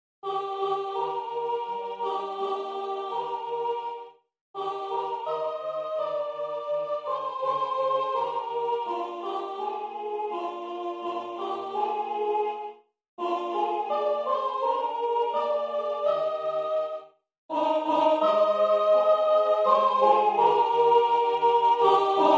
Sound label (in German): Wiegenlied in Es, sop